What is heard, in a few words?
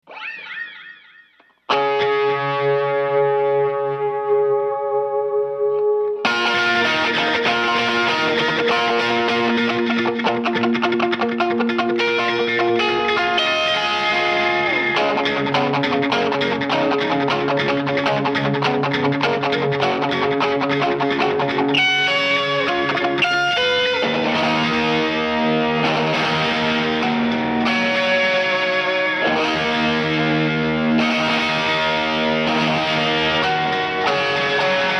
bridge axe ac30